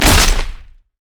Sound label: bola strike; rengar